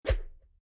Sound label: raise hand; element-call